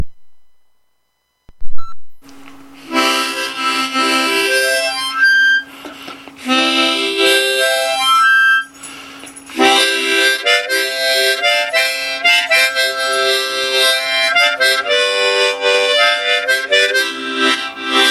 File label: harmonica